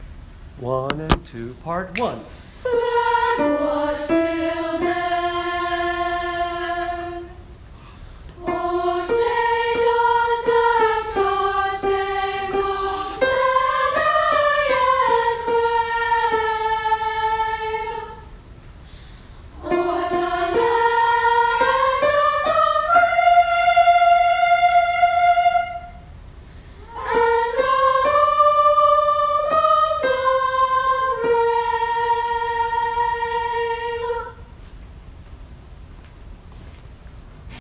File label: Star Spangled Banner Sop Ending